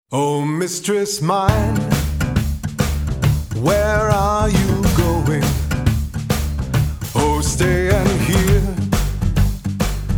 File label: Blues